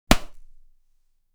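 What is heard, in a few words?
impact hardshell resistant, collision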